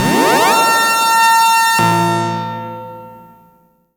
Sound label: organ synth; InVision Interactive; Keith Emerson; The Most Dangerous Synth and Organ